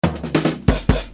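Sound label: drum roll; Wired for Sound Pro CD; Aristosoft, Inc.; drums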